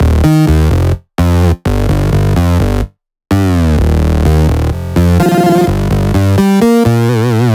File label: 127BPM Melody; Vengeance Samples; Com Pop Kit